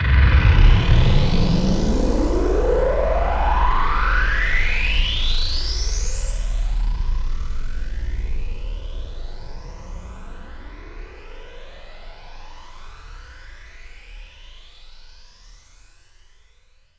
BD SPAC, BASS DRUM FX; Zero G; Malice In Wonderland

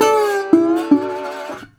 120, Blues Guitar; ILIO - Fretworks

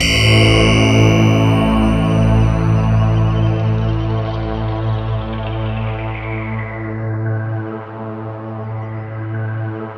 SYN SYN, Roland; Sound & Vision - Gigapack I CD 2

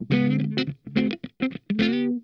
STOP START, Funk Guitar; Zero G - Funk Guitar

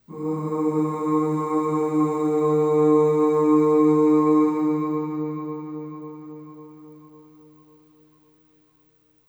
Ooh Long D#2 Long Reverb; Harmonic Building Blocks

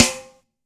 AMB SN, AMBIENCE SNARE

